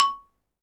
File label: XYLOPHONE, Percussion; ILIO